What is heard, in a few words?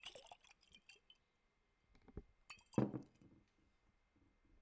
Glass Bottle